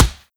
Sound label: mud, bullet impacts; physics; Half Life Model Pack